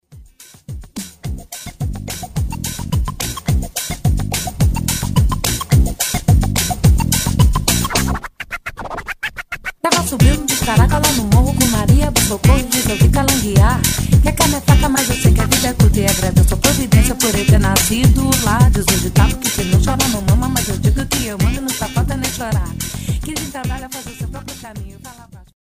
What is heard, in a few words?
Samba